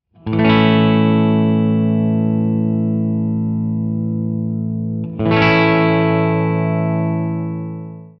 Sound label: A Chord, guitar